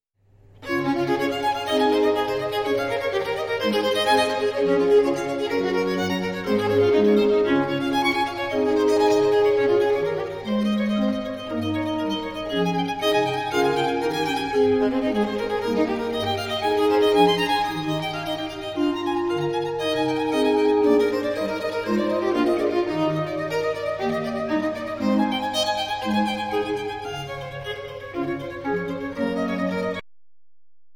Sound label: Corelli Courante